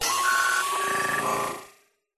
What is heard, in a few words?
science fiction computer; Audio Mangler